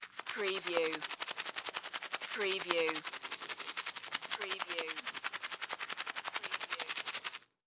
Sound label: Brush Teeth Fast; sound-effects-bible